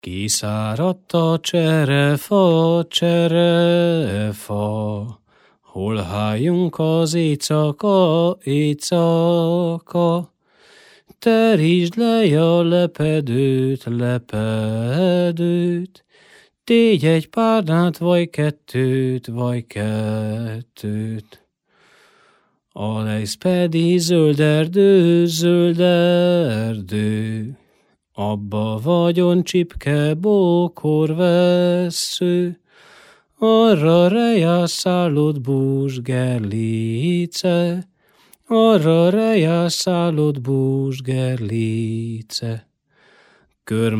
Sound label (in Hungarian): nepdal kiszaradt a cserefa